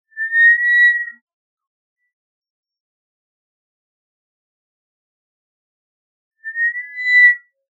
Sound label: giant eagle owl, juv, Lk Baringo, daytime